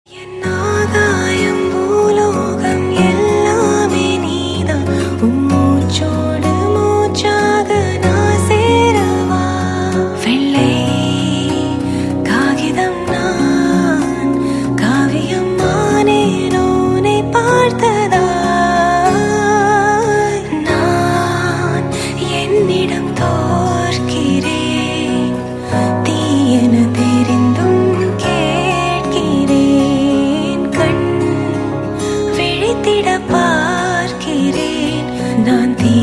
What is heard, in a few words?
female; tamil; kavalai vendam